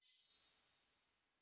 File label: answer rise